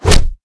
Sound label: claws, weapons